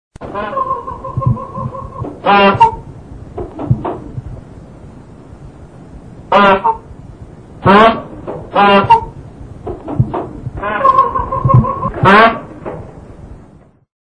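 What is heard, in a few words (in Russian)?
звуки гусака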